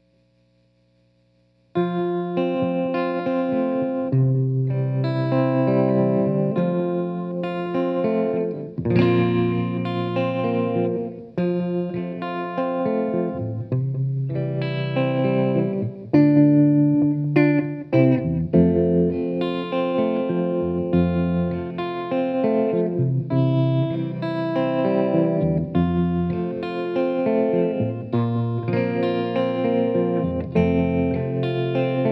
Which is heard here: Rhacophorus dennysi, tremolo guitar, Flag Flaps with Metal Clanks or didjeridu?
tremolo guitar